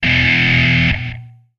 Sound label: Music Dist El Guitar